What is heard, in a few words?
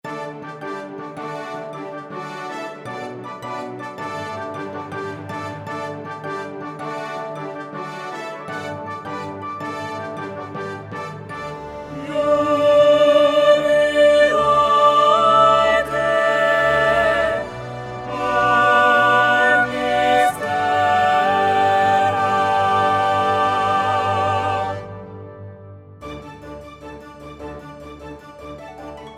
Jubilate Deo Soprano dominant